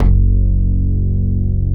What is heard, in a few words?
PUNCH BASS; BASS SOUNDS